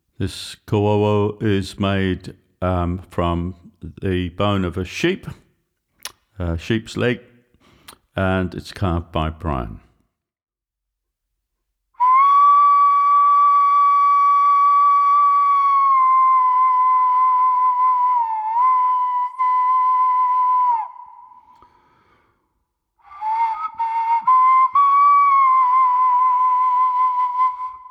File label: Koauau Wheua Hipi